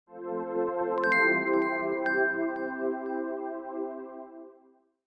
Power On Tone; LG G360; On Off

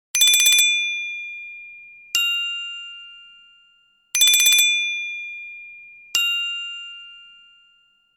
Bicycle, Nokia Asha 201 Ringtones

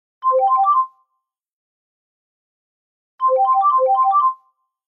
Incoming; Nokia N92

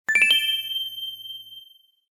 Vintage, notifications; Alcatel OT-991D

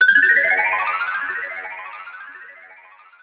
Skate waltz; Pantech PN-210

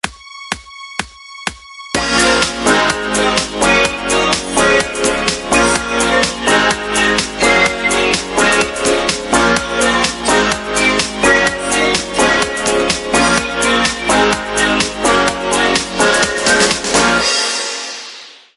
Filter Freak; Sony Ericsson Z520a

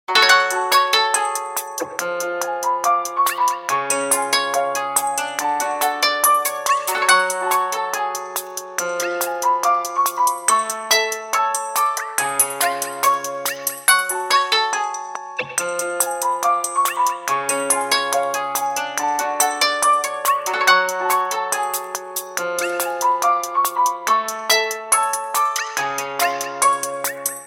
KEEPING TIME, ring tone; HTC; E-Club Ring Tone Pack 2

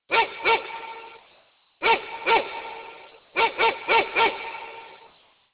Dog; Sanyo; SCP-5150

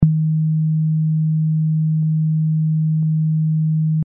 Vib type; Motorola E398; Hijacked by MTV

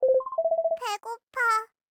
batt low; lowbattery; witty

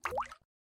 ripple touch release, phonetones, ui; LG Optimus G F180K

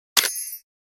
camera click

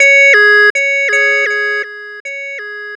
Wave alert; PlusFourSix

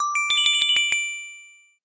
trickle, alarms; Google; Google Sounds; Play It Loud

